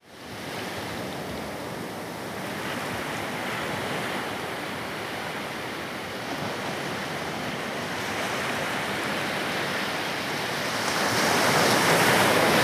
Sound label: Ocean Waves